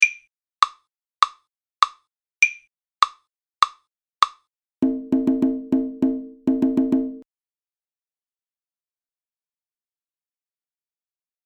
Rhythm Dictation

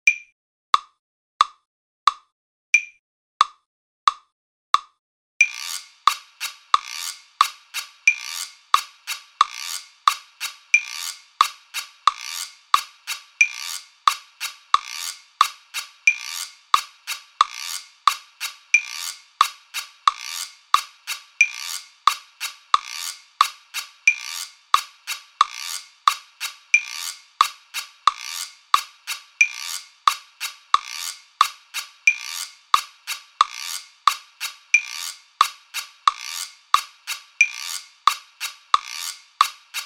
Guiro

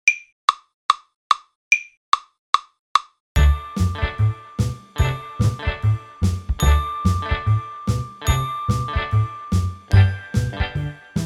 12 Bar Blues in D

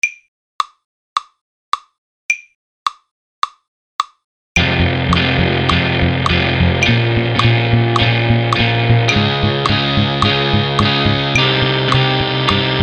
Fill Bar Surprise

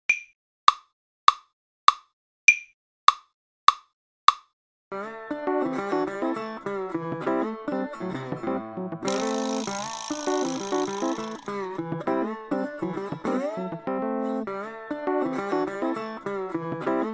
Beat fill Solo

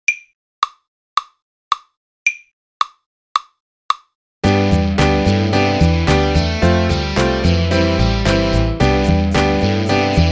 Crotchet 4 bar Phrase

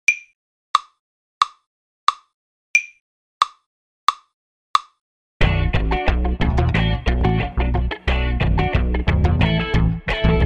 Kit Solo

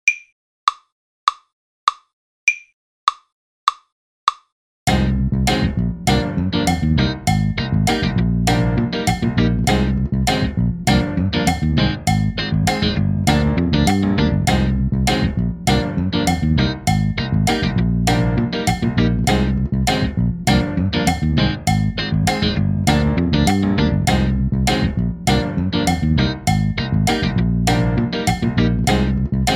Kit Solo D

